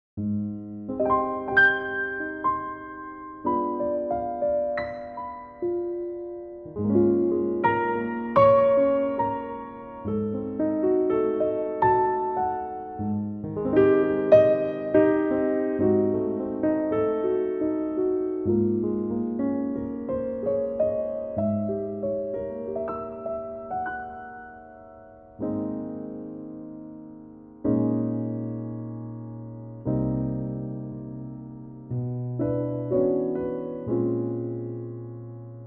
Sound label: Ab piano